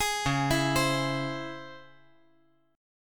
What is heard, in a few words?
C sharp Minor Major 7th; arpeggiated; chordshape; 13,13,12,15; JGuitar